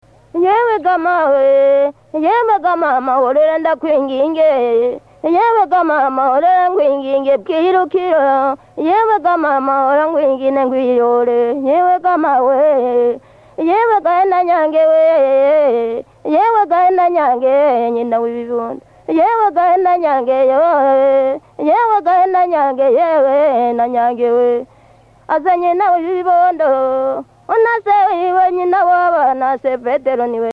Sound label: lullaby, Burundi, Hutu; Muramvya